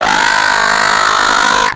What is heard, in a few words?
dalek sqeak